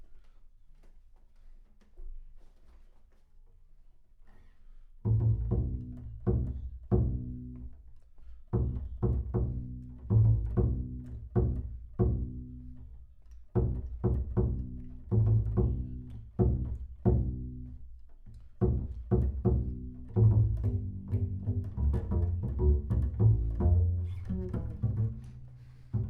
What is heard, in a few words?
Bass Room